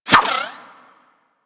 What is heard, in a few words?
Pet Skip Rope Whoosh; Warframe; Moa; Tenacious